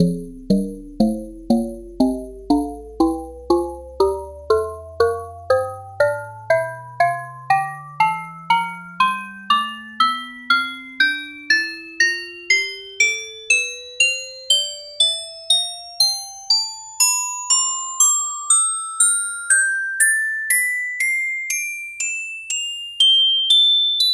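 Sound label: glockenspiel scale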